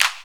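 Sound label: clap; E-Lab; X Static Goldmine 1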